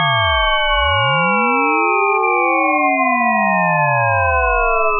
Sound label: slow chirp